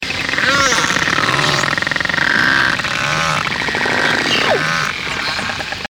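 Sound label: beluga; beluha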